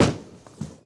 Strike Throw

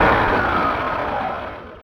Falcon Engine Stop Sound; Feel; FeelDemosHDRP

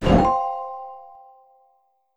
Spawn; High Ground Royale Fusion

